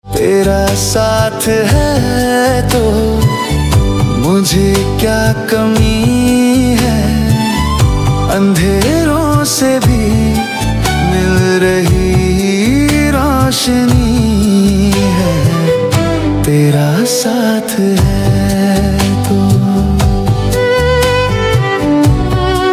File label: AI Male Ringtone